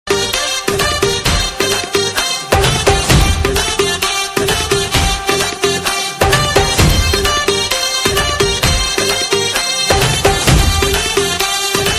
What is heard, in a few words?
BGM Ringtone